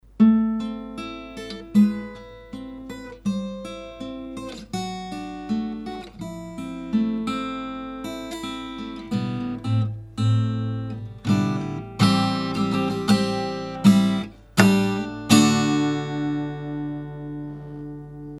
Audio-Technica ATR-1200 gitara metal, гитара (железо); Звучание Audio-Technica ATR-1200 — гитара (железо); динамических кардиоидных микрофонона; Рассмотрим 4 бюджетных динамических кардиоидных микрофонона от Audio-Technica, линейки ATR

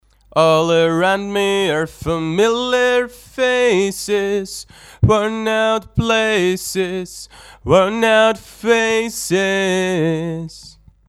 Audio-Technica ATR-1100 vokal, Вокал; Звучание Audio-Technica ATR-1100 — Вокал; динамических кардиоидных микрофонона; Рассмотрим 4 бюджетных динамических кардиоидных микрофонона от Audio-Technica, линейки ATR

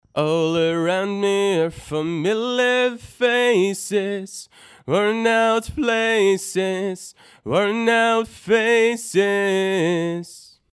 Audio-Technica ATR-1300 vokal, Вокал; Звучание Audio-Technica ATR-1300 — Вокал; динамических кардиоидных микрофонона; Рассмотрим 4 бюджетных динамических кардиоидных микрофонона от Audio-Technica, линейки ATR

